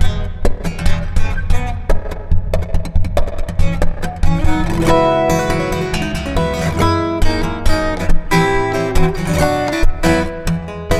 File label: Lush Acoustic